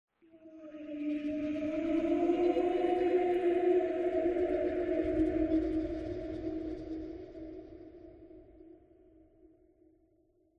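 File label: random laughs; ambient; Half Life Alyx; xen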